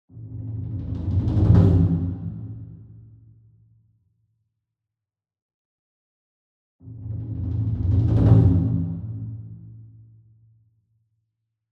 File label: SINGLE DRUM Taiko 28 Inch Roll Soft Beater Far; boom library; Cinematic Strikes Construction Kit